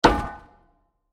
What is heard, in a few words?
Metal Bowl Impact; Miscellaneous Impact; Impacts; Crafted Signals